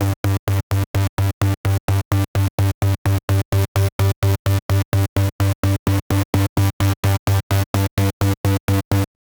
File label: 128 bpm; synthesizer